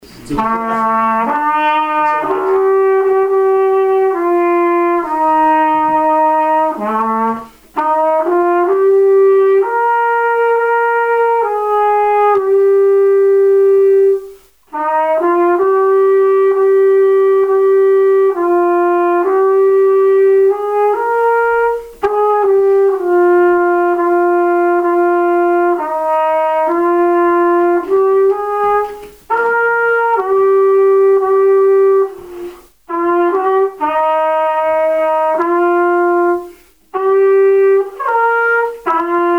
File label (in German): trompete